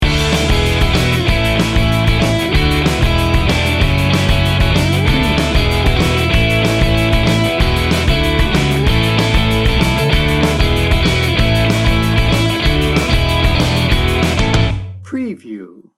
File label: upbeat indie rock; Upbeat and energetic, featuring a driving Indie-Rock groove with bright electric guitars.